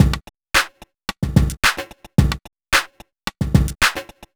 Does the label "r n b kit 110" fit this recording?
Yes